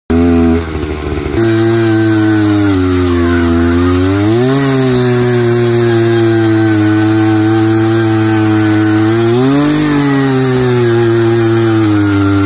Drilling rig

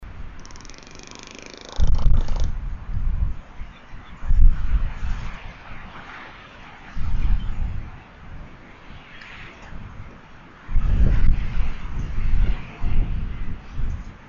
Albatrosses; پرنده آلباتروس

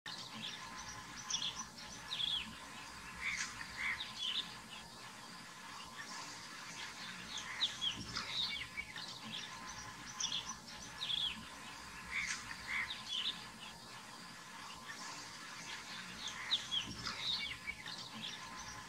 marsh harrier

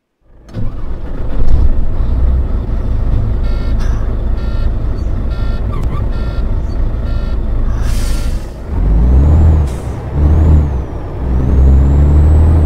Volvo FH16 Sound

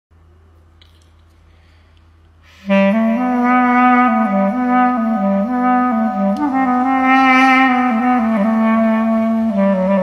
Turkish flute; فلوت ترکیه ای

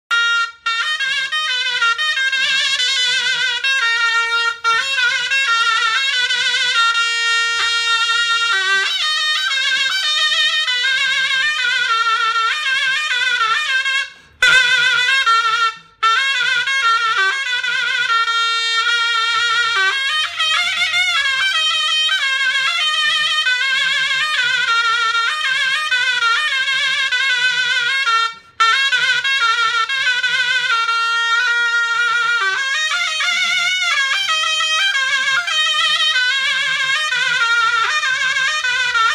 Zurna

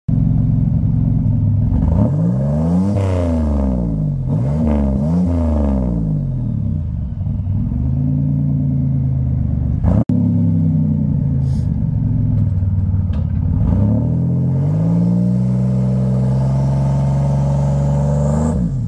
Buick; اگزوز

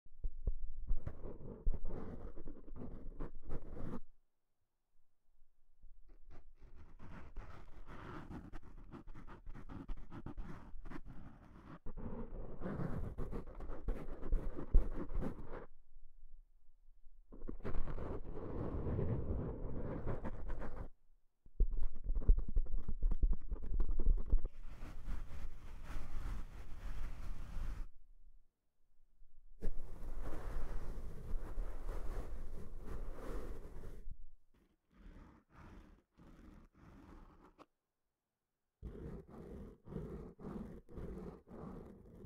microphone asmr; اسمر

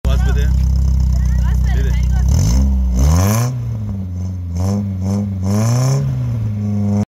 Tornado exhaust

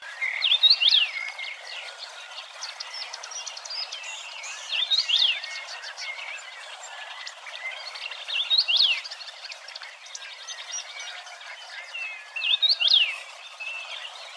Common Rosefinch; پرنده توت خورک

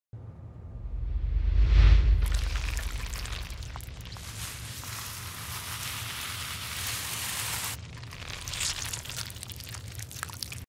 Polish corrector; لاک غلط گیر

